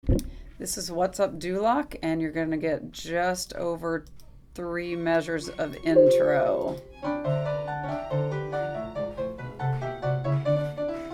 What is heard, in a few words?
ensemble music